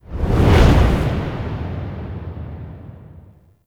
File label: Transition Whoosh; Warframe; Quests; Wraith Quest